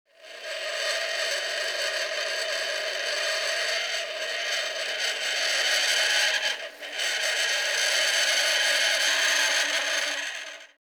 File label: metal scrape; Asian Show Sound Effects